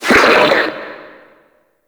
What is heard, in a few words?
Grineer Frogman Pain, Vocals